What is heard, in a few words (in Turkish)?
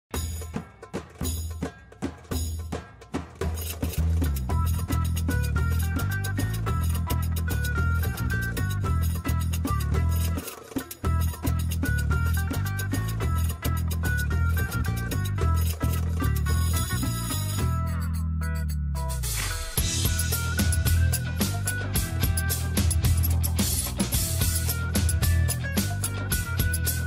Enstrümantal fon müzik